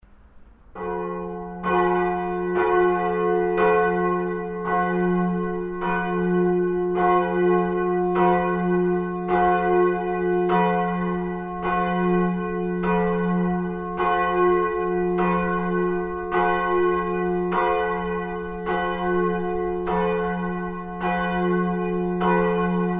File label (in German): Glocke; St. Lorenz; Kirchenrenovierung; Oberföhringern; Wandlungsläuten an Hochfesten; St. Laurentius